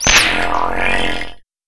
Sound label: SHARK SCREAM; sloodle; gaming